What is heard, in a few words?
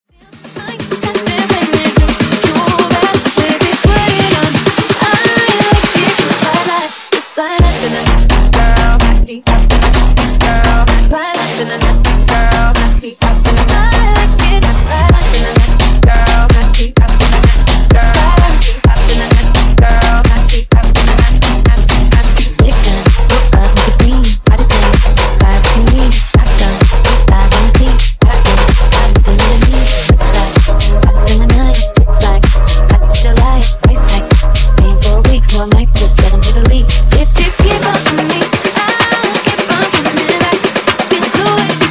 Dope Banger MashUp Clean 128 Bpm